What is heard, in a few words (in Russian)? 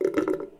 voda vsasivanie korotkij blizkij